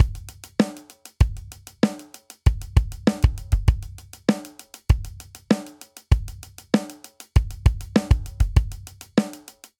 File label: Straight Hip Hop Chorus 98 bpm, Drum; 4 4; Ableton; Factory Packs; Drum Booth